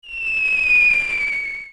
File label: Artillery Going Fast; 1944 Battle Of The Bulge